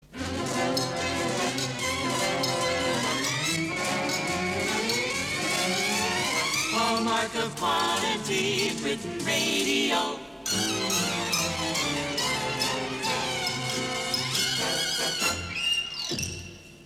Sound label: jingle Hallmark of Quality; Britain Radio